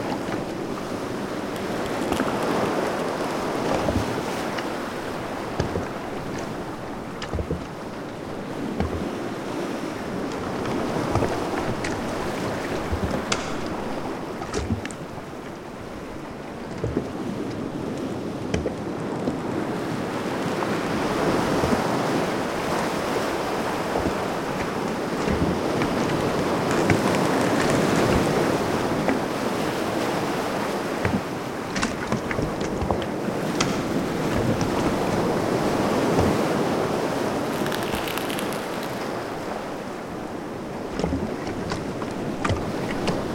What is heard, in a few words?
Amb Harbour; Sea Salt; Windows gamerip 2019